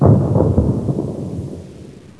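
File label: explosion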